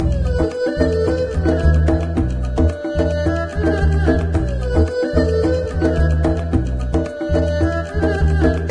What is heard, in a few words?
Japanese Music; Diner Dash 2 Restaurant Rescue